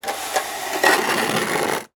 Weather Snow Shovel Scoop Snow On Sidewalk Heavy

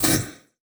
Multimedia Internet CD-Rom Flash Blast

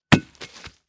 Impact Brick Drop And Roll On Leaf Debris

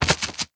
Impact Body Fall In Gravel

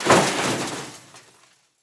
Crash Car Fender Bender With Glass Debris; Crashes

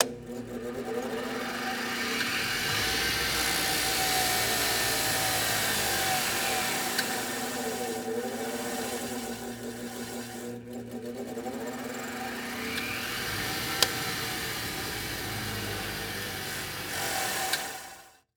Industry Tool Grinder Cut On And Change Speed

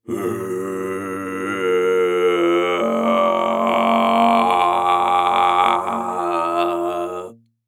Human Growl Through Tube

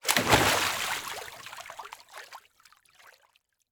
Liquid Water Water Splash Hands Big Splash

